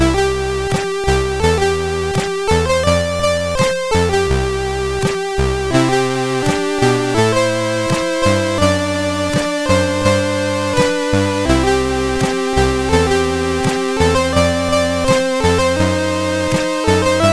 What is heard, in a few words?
Sync Solo, CSG8580R5; hvsc; MC